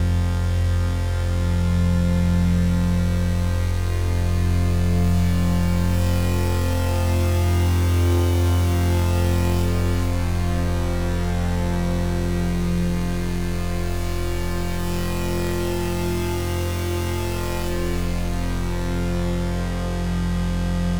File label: Flytrap Overlay Drone